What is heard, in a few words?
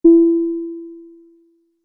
rollout